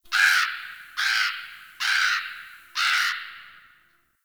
Tree Critters Scared; Ambience; Props; Warframe; Duviri

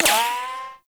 Exalted Sniper Ricochet; Warframe; Frumentarius

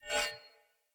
Short Sword Whoosh Accent; Weapons; Player; Swords; Sollos

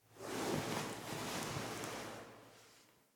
idle stand up; Ambience; Warframe; Entrati; Tagfer

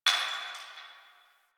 Distant Glass Breaking

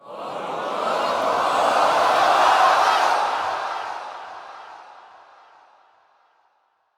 Crowd Excitement Start Up; Lunaro

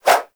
Generic Bow Load Whoosh; Warframe; Foley

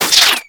Ink Rifle Reverse Start, Weapons; Warframe; Tenno

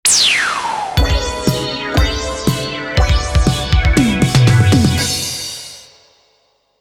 Cinematic Music; Warframe; Ambulas Reborn

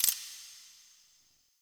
Choice Hover, UI

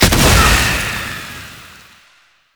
Boss Clone Death; Warframe; Oraxia; Abilities

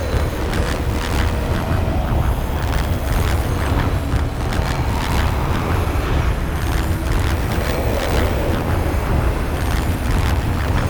Absorb Loop; Warframe; Nyx Updated Sounds

